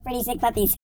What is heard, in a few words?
E TALK, Vocal Planet R&B & Dance